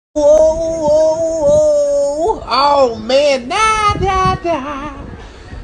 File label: woahoohwoahooh